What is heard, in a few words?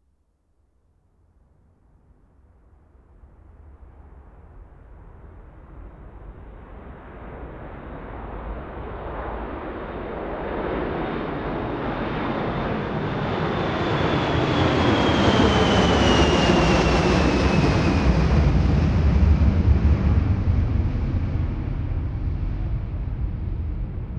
Surround Demonstration; Denon Jazz Sampler plus The Audio Tool Box